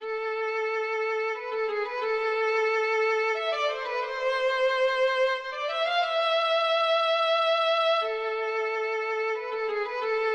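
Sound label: violin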